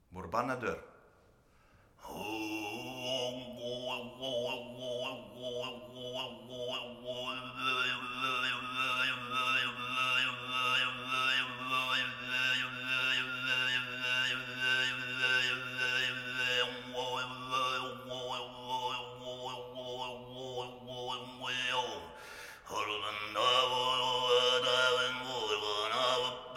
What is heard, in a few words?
borbangnadyr Style